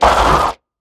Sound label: death; Barotrauma; Crawlerbroodmother